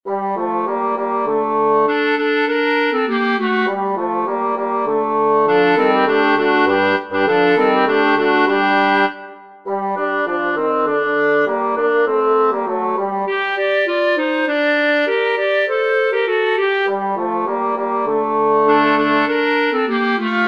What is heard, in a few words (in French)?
Ils sont bien pelés Tutti; Concert du 13 décembre 2024 en l'Église St Pierre - St Paul